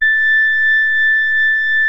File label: MOOG SOFT A5, LEAD; Music Store - Claudius Diemeter Schwerlos